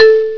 DING LO; ALARMS

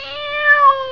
CAT MIAOW; ANIMALS

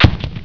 GRENADE; WEAPONS

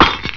CERAMIC, IMPACTS

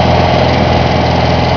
BIKE RUN; TRANSPOR

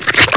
SPLAT

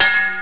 CLANG

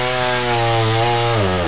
CHAINSAW, MACHINES

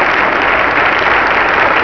CLAP BIG; PEOPLE

